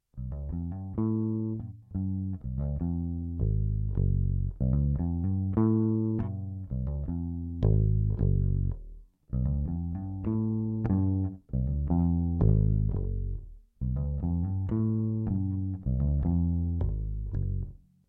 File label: bass effect buff